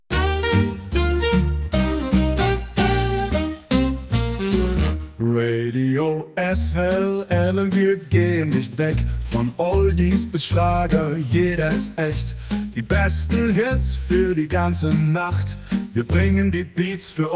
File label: Swing